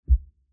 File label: hover on